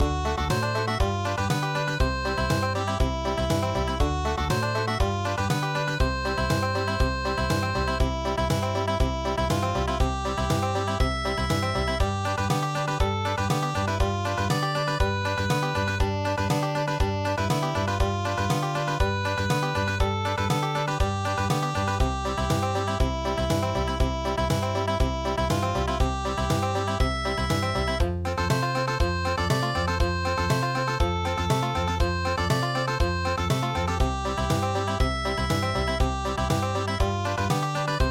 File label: Country, midi; HE ALOHA NO O HONOLULU